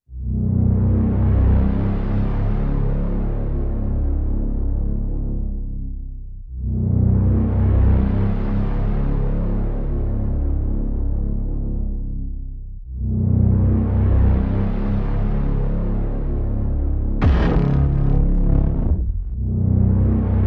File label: No Percussion